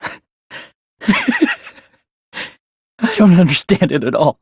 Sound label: laugh understand; dr2; hajime